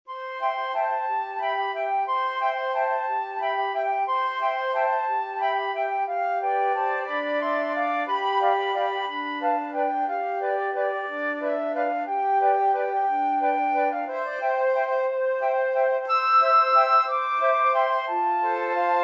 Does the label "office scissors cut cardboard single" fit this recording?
No